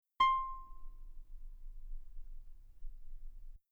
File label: guitar C6 very long piano normal; bananenkeyboard